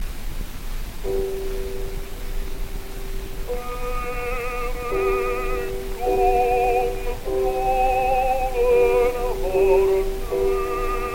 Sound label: Gramophone